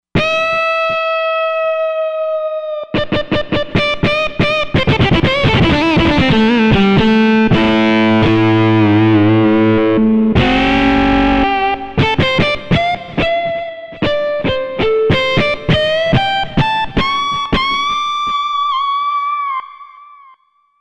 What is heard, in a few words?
Gt Lead Switch